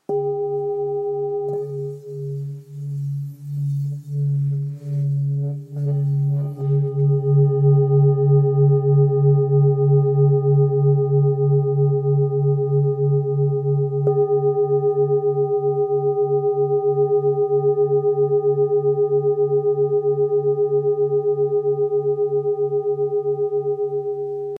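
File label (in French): Full Moon do diese, bol chantant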